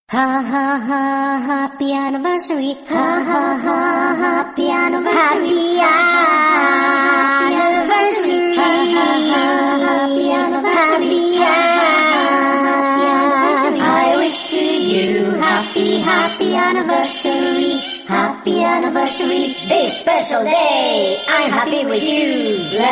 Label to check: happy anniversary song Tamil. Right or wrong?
Right